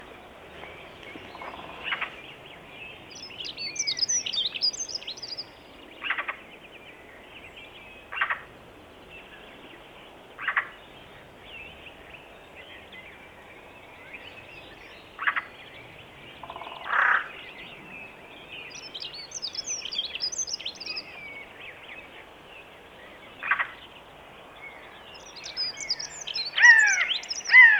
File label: Garrulus glandarius; SETE